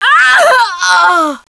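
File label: die, alien controller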